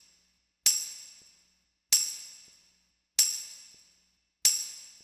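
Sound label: Hip Hop Tambourine Rev, 095 bpm; Digidesign; Pro Tools; Pro Tools Essential Loops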